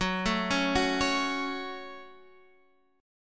F sharp Minor F sharp, Chord; F#m arpeggiated; 14,12,11,11,14,x

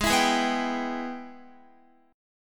Ab Minor Major 7th Sharp 5th, down, Guitar; strummed; AbmM7#5

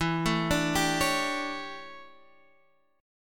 D Major 7th Suspended 2nd Suspended 4th, chord

